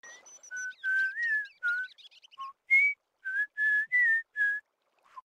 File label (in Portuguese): assobio